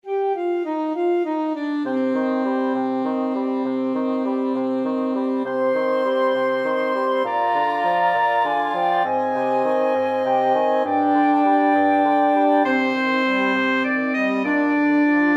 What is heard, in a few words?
spanish violin, flexible mixed ensemble 5 players